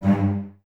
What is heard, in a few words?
Cellos, String Ensembles; G 2; Miroslav Vitous; LINKS ORCH